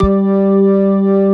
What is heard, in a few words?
Somber Organ; Mo' Phatt; Digital Sound Factory Mo' Phatt